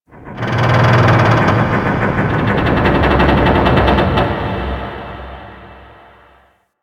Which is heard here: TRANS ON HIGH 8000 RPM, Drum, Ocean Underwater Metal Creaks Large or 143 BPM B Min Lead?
Ocean Underwater Metal Creaks Large